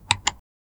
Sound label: soft button press click b